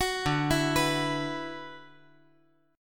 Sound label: D 6th Add 9th D